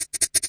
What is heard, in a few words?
141 swinging crunchhats; 141 to 160 bpm